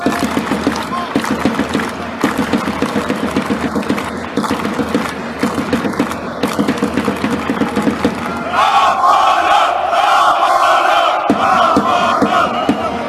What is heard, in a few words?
fanchants